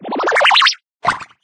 Mystery Box Normal

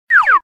SLIDING

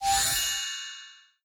random boost

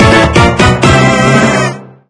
Bgm Jingle

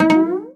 slide

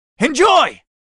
Sparkling skill

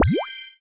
buy success

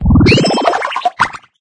Mystery Box Good

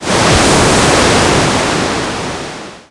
Transform Start; Captain Ice

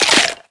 Slide; Captain Ice

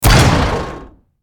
Transform Start; Roll Cake

